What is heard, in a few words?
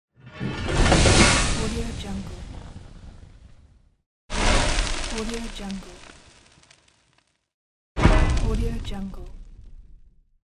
smelt